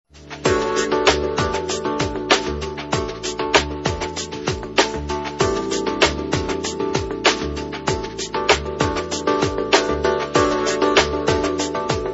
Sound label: Alarm tune; Daydream